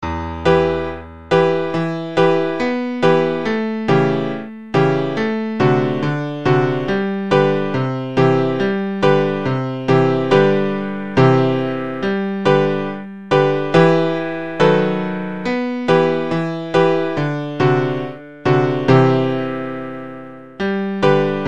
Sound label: accompaniment only